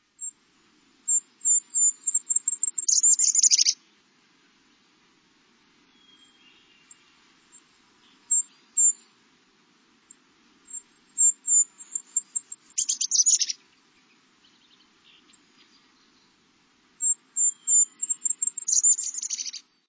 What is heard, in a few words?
Golden crowned Kinglet